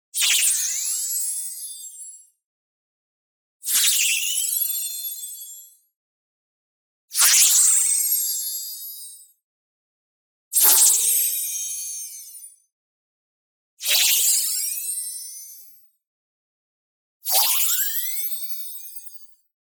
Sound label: TONAL Shing Emote; boom library; Anime Essentials